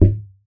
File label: impact Punch heavy